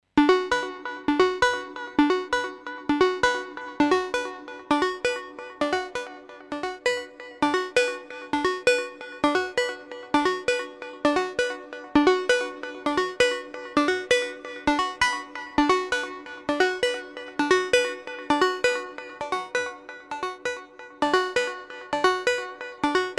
Moog Labyrinth Sequence

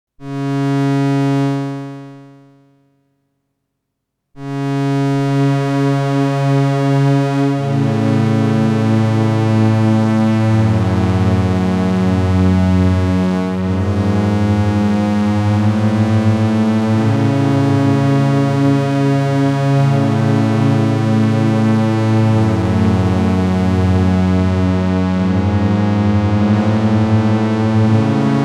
Synthesizer Modulation; Free the Tone Future Factory

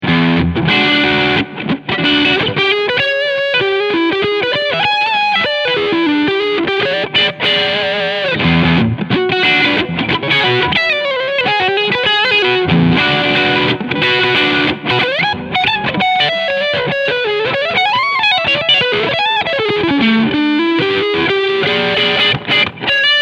Chapman Guitars ML3 Pro X Lead Sound Front PU

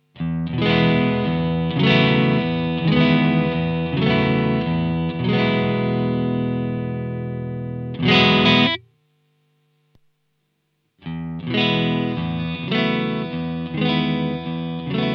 Amp MS Stack; Simlpifier; Amp AB

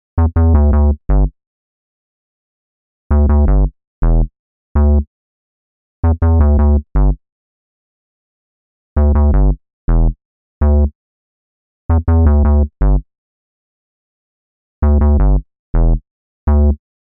Bass A Bridge

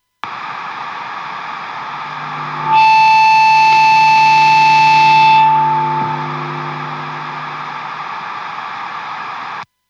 Fortin Zuul Blackout Distortion Pedal Noise

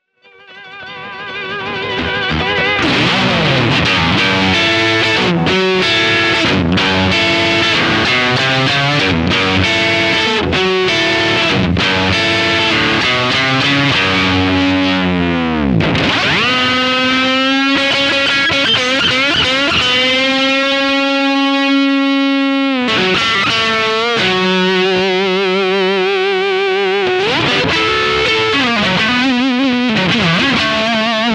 Driven Amp; Electro-Harmonix Lizard Queen

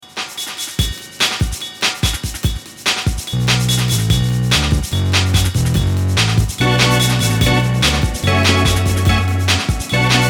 Dub; Behringer 646

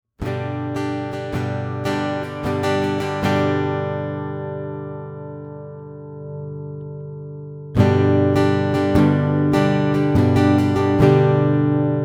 ibanez aam700ce tone poti undersaddle pickup

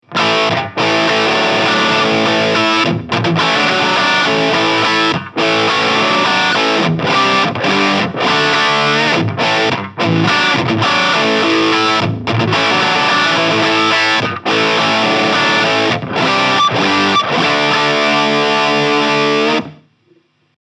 Hard More Gain Less Bass; JHS Pedals

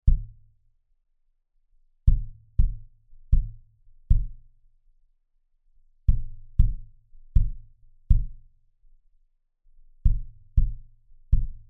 BL8 Omni Kick Modern

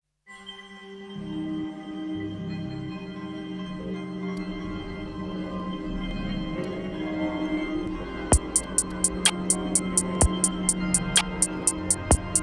Korg Kaoss Replay Finger Drumming

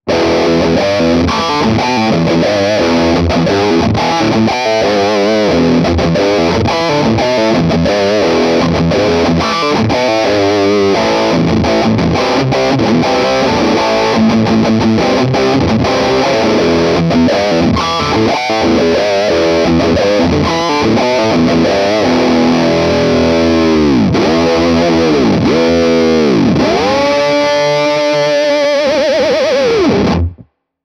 Morgan Amps Doomish Riff SW50R

